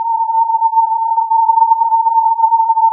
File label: filtered input